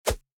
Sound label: generic throw; weapons; grubs